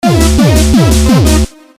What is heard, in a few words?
hardcore